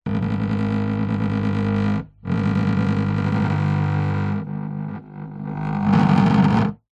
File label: wood hollow vibrate fast object; foley; zapsplat